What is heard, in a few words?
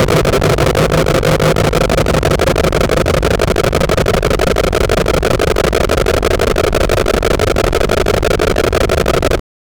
a horrible screeching